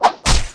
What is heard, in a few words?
rapier hit, weapons